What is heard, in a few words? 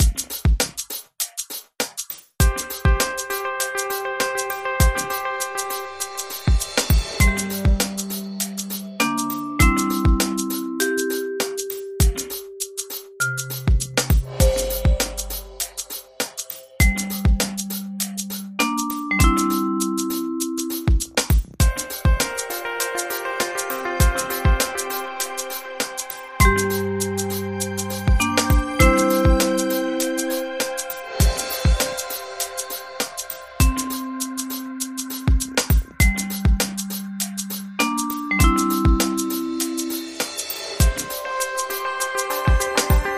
Trip Vibraphone